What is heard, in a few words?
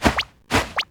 distraction; crab